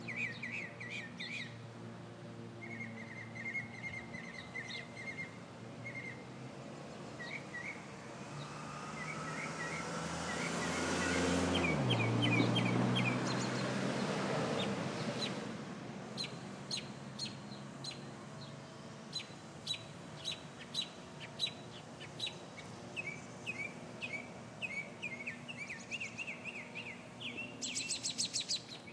sounds of brooklyn in the spring sounds like the suburbs